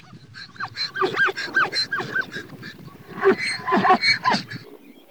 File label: ZEBRA; ANIMAIS